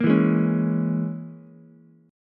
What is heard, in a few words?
E 6th Flat 5th E down Ukulele; E6b5 strummed; JGuitar